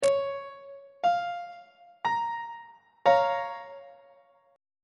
b flat minor 1st inversion; on treble clef

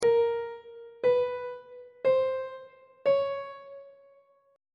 a sharp 2nd intervals on treble clef

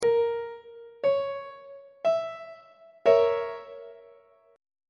b flat diminished chord on treble clef

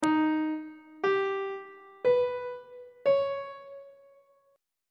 d sharp augmented 7th chord intervals; on treble clef

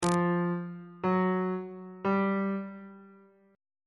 c sharp 4th interval pitches on bass clef

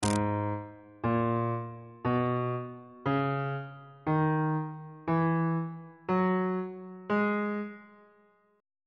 a flat minor key signature

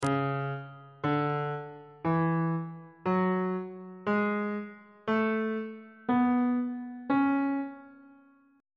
d flat phrygian mode note positions on bass clef

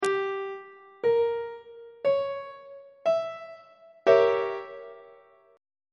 c sharp diminished 7th 2nd inversion; treble clef

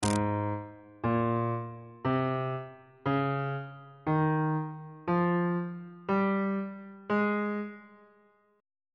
a flat major key signature

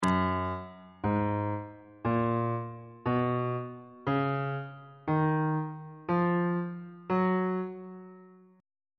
g flat ionian mode on bass clef

